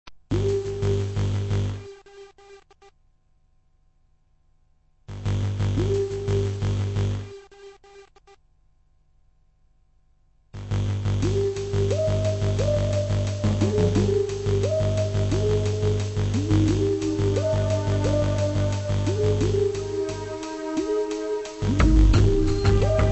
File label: rain msx